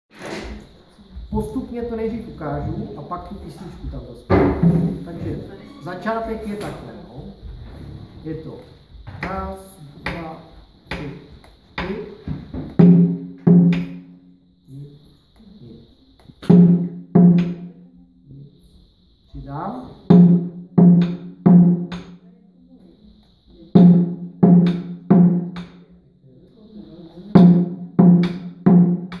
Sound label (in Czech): Bubny, djembe